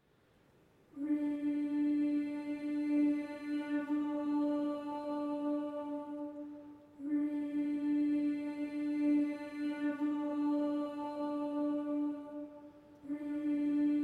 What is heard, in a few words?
Shall We Gather at the River (Arr. For Vocal Ensemble); Lowry